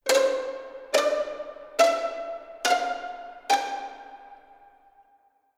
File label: Strings B Pizz